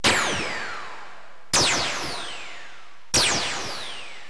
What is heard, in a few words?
scifi; GRSITES Graphics